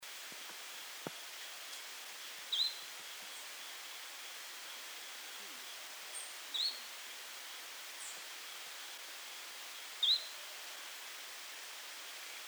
Western Striped Manakin Machaeropterus striolatus undescribed Peruvian form